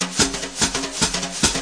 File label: 148 jazzy brush snare; 141 to 160 bpm